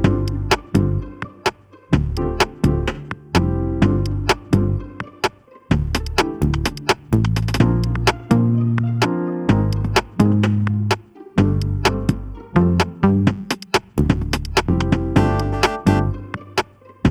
Intro bgm; onisep

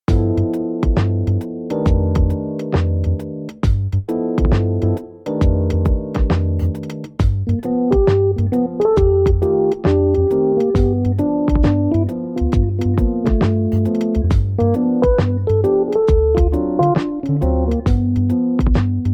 Blues; Blues moral; costume 16 pièces

